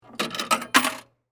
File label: Arcade Game Insert, Technology, Arcade, Game, Insert, Quarter, Into, Slot; SoundEffects-BlastWave